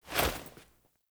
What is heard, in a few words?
Foley Action Default Melee Heavy Swipe, Default Clothing; Characters; Player